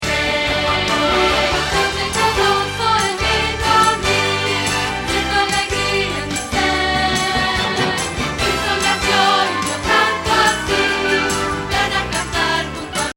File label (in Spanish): Contralto